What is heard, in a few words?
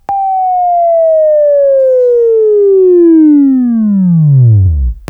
Buzz; Labview-Program; Sweeping Training program